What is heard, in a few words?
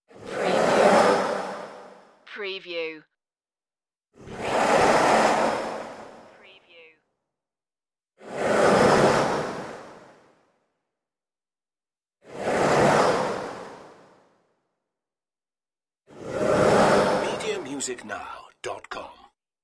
eerie flyby whooshes